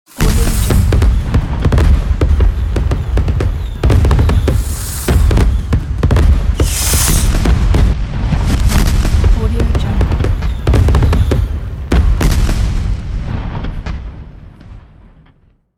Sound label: fireworks